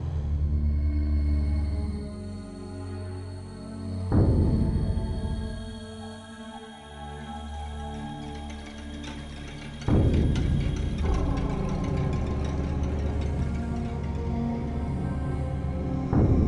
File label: spooky, loop